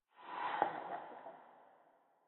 水下动物声; ambient

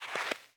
细雪踩踏声

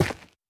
远古残骸破坏声

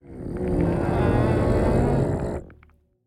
焦躁声; 监守者

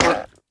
海龟受伤声

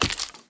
脚步声; 沼骸

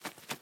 鹦鹉飞行声

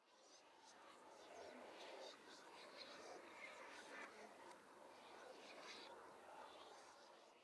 灵魂沙峡谷低语声; ambient

